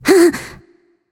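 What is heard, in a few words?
nonverbal confused; smite; voicepack; izanami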